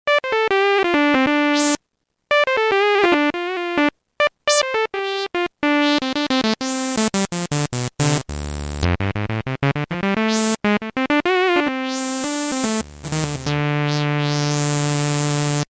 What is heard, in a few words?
Evol Saw; Roland M-DC1